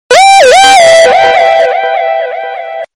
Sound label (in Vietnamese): nhac chuong tin nhan to cho nguoi gia